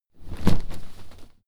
clothes harsh hit drop

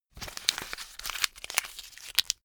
notepad sheet crumple fast

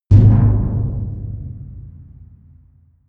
big metal impact low long

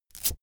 velcro open cap fast

